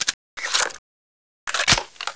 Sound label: m16 reload; svencoop